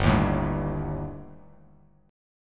G Suspended 2nd Sharp 5th, Bass Standard; Gsus2#5 strummed; JGuitar

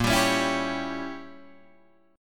A sharp Diminished 7th Guitar Open D

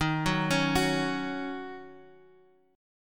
D sharp Minor 7th; D#m7; arpeggiated; x,6,4,6,7,x; chord shape

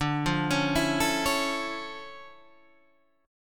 D Minor Major 9th, D, down, Guitar Standard; DmM9; arpeggiated; JGuitar

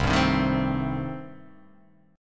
C Minor 6th, chord

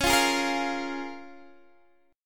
C sharp 6th C sharp down Guitar DADGAD

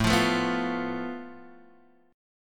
F Major Flat 5th A

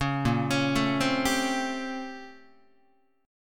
Bb Minor Major 13th Bb, Guitar; BbmM13; arpeggiated; JGuitar

